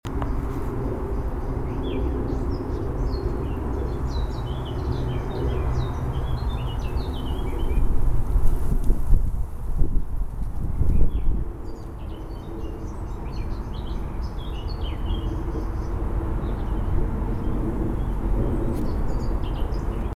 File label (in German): Aussen ohne Windschutz, Roland R-05